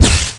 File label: Bullet Shield, Impacts